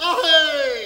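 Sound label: rhythmic vocal; Best Service; World Colours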